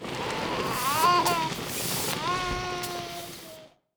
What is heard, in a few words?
House, completion; 0AD